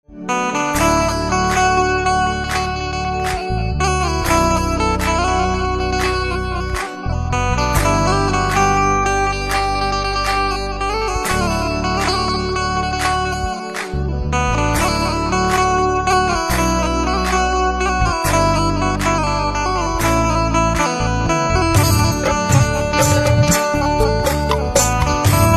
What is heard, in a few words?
Banjo